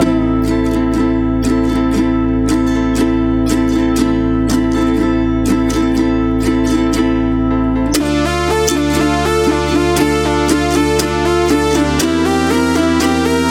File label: guide track VERYsolo slow 60bpm